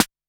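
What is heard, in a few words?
Electro Kit Snare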